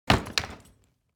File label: Small travel suitcase setting down